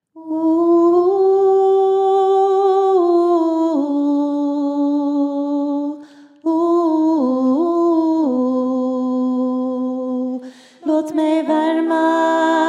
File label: Baritone